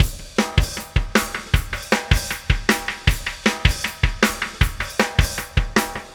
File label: Oscillation Beat